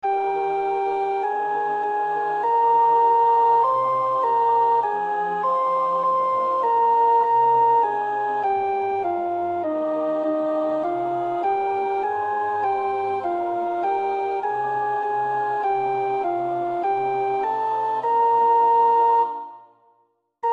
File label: tenor; Mehrtens (Frits); Gezang 173